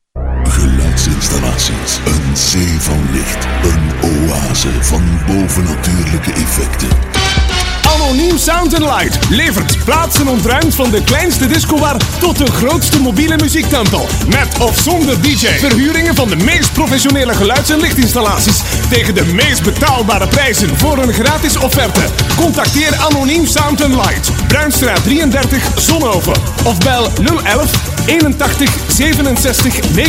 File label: jingle; geluidsinstallaties